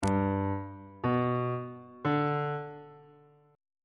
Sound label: lydian chord 2 is g major on bass clef